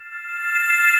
a soft magical hover sound, airy

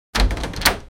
door open sounds effects

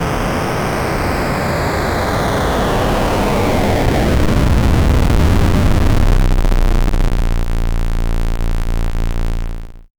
a negative failing sound

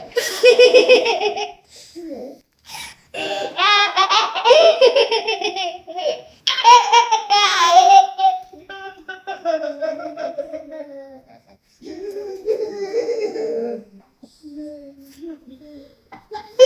human

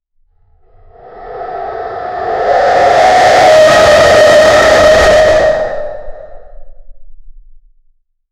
dinosaurs, sound-effects

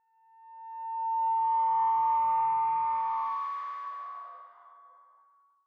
creature

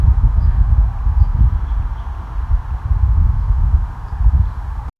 birds wind distant animal; animal calls

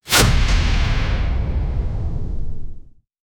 sci-fi cyborg greatsword

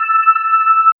a very soft pad synth

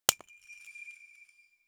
golden cigar sparka sharp

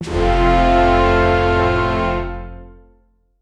brass

